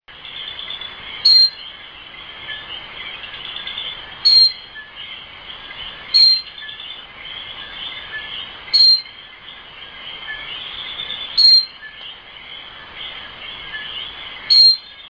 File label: eleutherodactylus cochranae carolina puerto rico; Its voice is a short, piercing whistle; West Indian